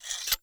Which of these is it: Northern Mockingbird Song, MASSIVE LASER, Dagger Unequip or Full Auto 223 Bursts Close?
Dagger Unequip